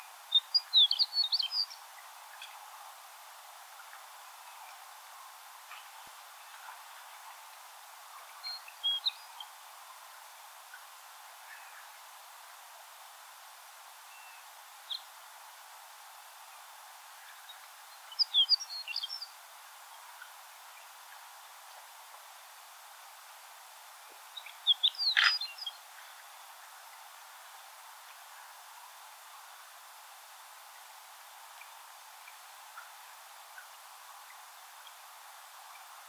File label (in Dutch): Strandleeuwerik; eremophila alpestris